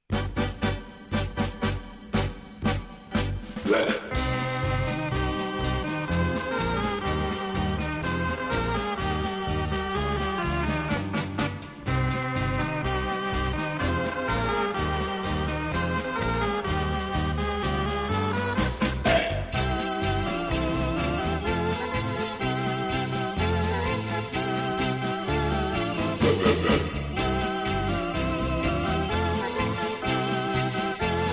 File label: hammond